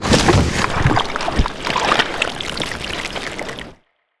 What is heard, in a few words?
swallow